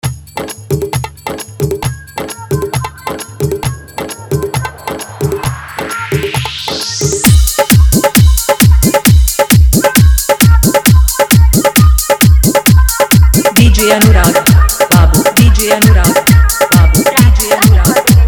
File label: New Viral Bhojpuri Dj Song Hard Dhollki Bass Mix